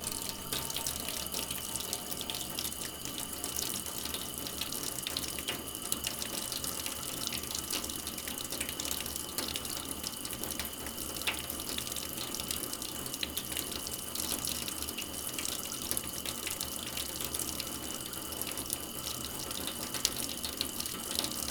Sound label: Tap Basin, water